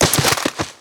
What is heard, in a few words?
body fall